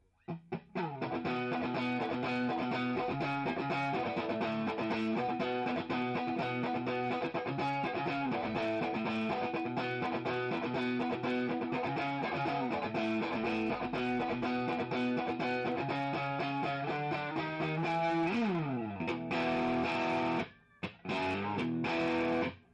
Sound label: Mid Distortion